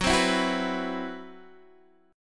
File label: C Major 7th Flat 5th Gb, chord